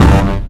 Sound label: Kick